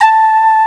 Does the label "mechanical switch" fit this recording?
No